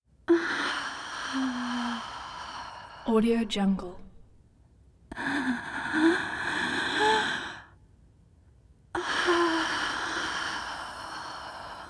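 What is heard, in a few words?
female moaning close up slow inhales exhales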